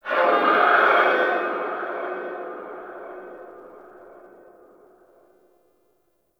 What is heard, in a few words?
Stadium Infested Far; Warframe; Ambience; Nineteen Ninety Nine